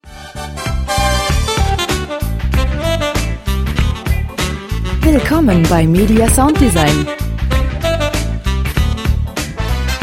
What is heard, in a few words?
jazz playing it cool